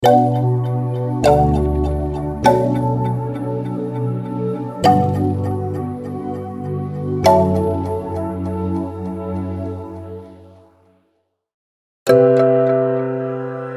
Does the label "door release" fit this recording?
No